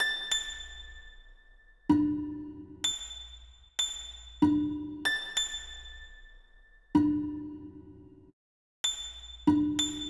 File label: Acoustic Piano